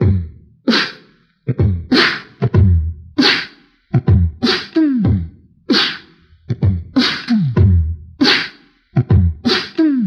drop the beat, Beatbox; Drum loops

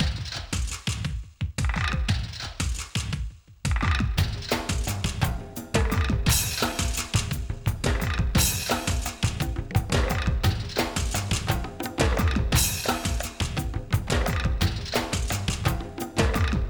jungle music, drum loops

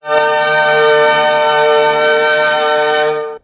Horns

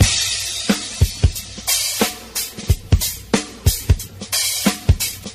Drum loops, Funky

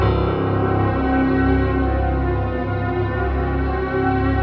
keys, Loops, Sad

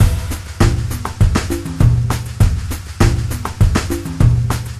samba, drum loops funky

